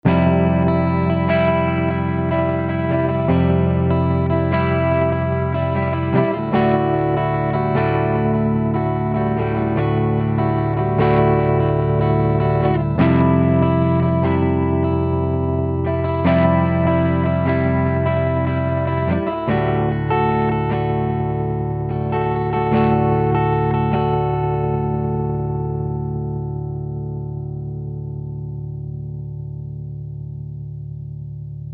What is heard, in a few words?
pattern trem; mobius